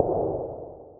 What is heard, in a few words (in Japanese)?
足音; LcdDem SFX